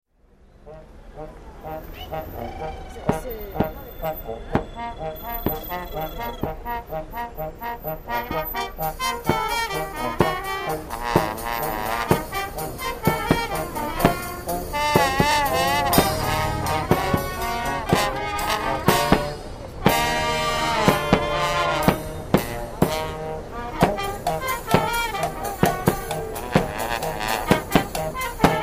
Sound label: fanfare; saint germain